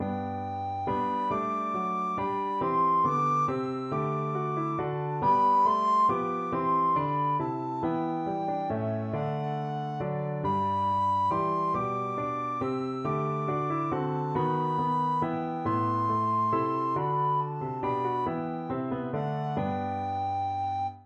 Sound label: quem pastores recorder